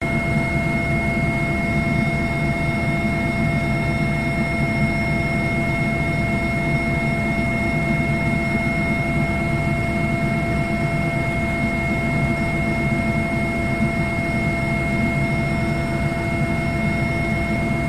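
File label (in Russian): Ми-8 военный вертолет полет в кабине окружающей среды звук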